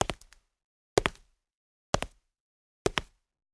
kroky mramor; Mafie 1

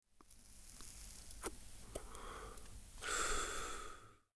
koureni; Mafie 1

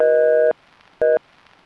tuuutuuut; Mafie 1

